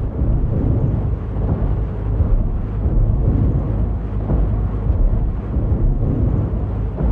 submarines engines; World Of Warships

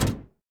P-51D Flaps End; DCS

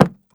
horse footstep wood hard; Rust

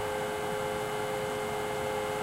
turret turn servo h, tanks; War Thunder

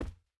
footstep burlap cloth jump land; Rust

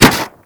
bullet canvas, weapons impact; Call of Duty Modern Warfare

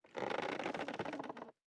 ambient; World of Tanks

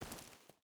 footstep barefoot gravel walk; Rust

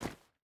footstep boots stones run; Rust

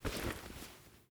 revolver deploy; Rust

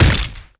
Death Hit; Civ4 warlords

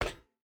footstep hide metal run; Rust

